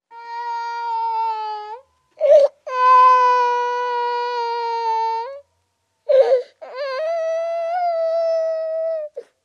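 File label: crying of a tired girl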